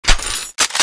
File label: railcanon clip in; csco; csgo